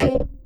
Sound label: perc, 100bpm; algorave